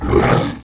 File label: nemesis pain